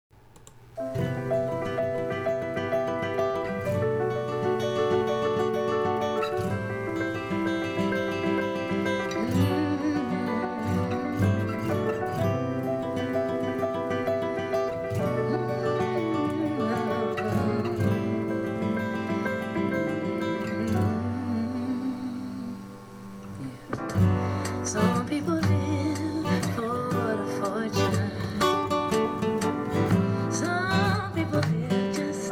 guitar

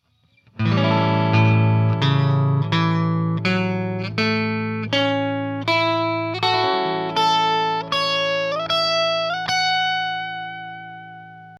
A13 guitar

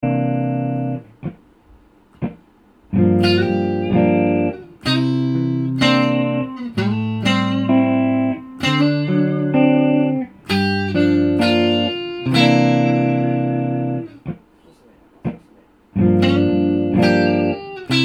fender sound

